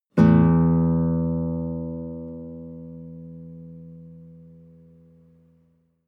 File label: nylon guitar single note